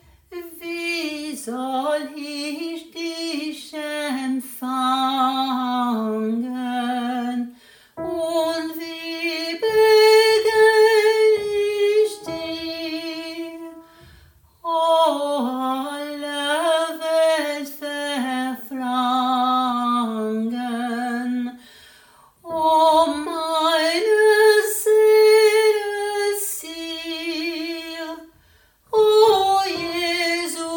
Wie soll alto

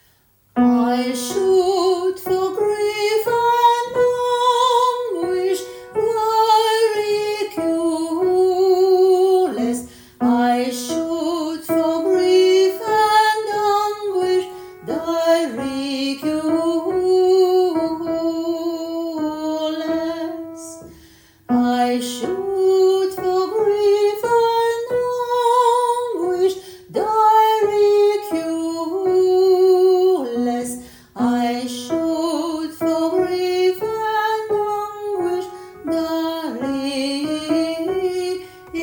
morley alto

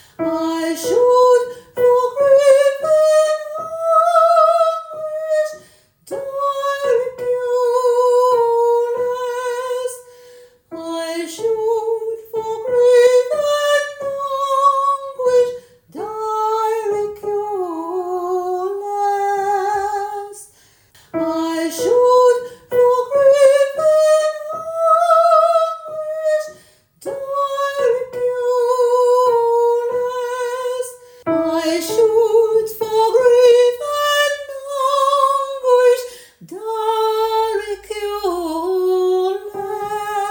soprano; morley

